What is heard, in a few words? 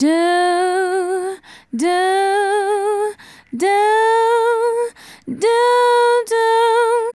Female Solo, Hip-Hop and RnB Vocals